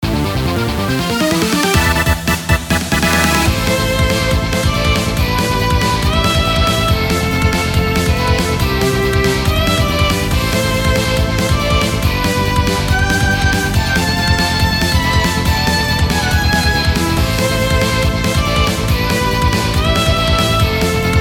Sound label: Battle; Halion